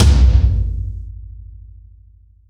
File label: drum loud bass